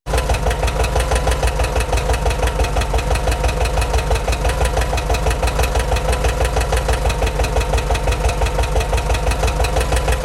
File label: Slack Rocker Arm, Engine Sounds